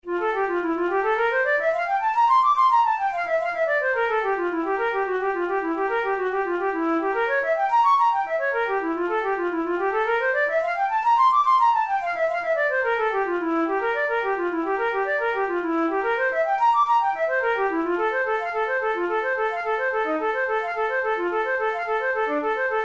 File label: methode pour la flute study; drouet